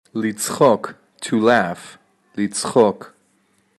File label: Laugh